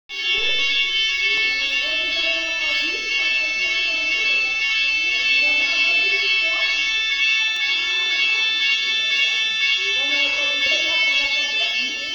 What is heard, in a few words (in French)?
alerte incendie